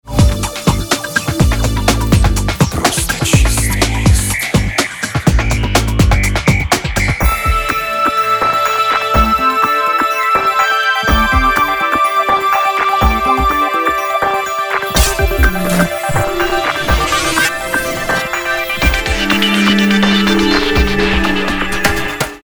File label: Trance